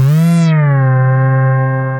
Synth Keys; Lowsyn 8020

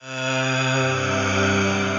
Slow Motion Voices, Choral Voices; Ableton; Factory Packs; Voice Box

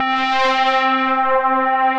Pad; Gritteson Pad

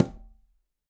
Quinto C Mute, Conga; Latin Percussion; Drums; Drum Hits; Ableton; Factory Packs

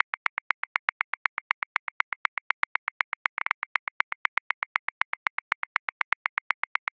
Straight Seven Rock 7/8, Grooves; Drum Booth; Ableton

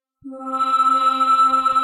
Pads, Synth Pad; Ganymede; Ableton; Glitch and Wash

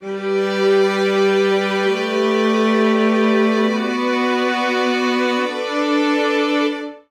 Progression G Major 135 bpm, string quartet; I-I ii-iii-v6-I-I ii-iii; MIDI Clips; Sage Speaks; Ableton; Factory Packs; Spitfire Audio

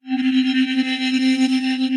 Flutey, Winds; Granulator III; Ableton; Factory Packs

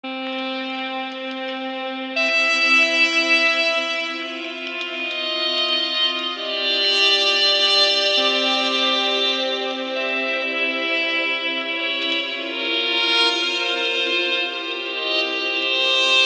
C Minor 118 bpm; MIDI Clips; Tonal; Arctic Circle; Ableton; Factory Packs; Lost and Found

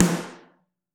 SN Mono, Snare

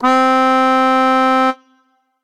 Melodika; Ableton; Factory Packs; Lost and Found

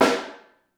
SN Room, Snare

